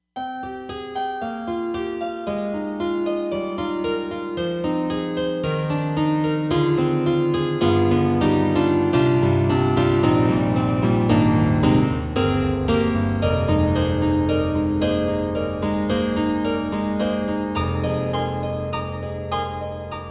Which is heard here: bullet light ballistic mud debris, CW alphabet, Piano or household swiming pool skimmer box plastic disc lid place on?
Piano